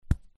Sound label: deal click; new-years